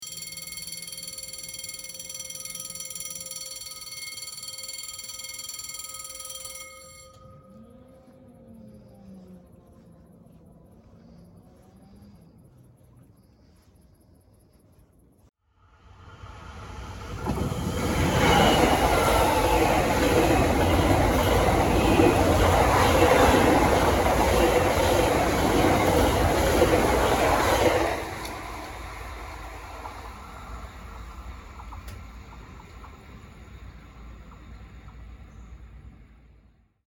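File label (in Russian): zhd pereezd i poezd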